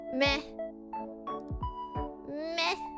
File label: meh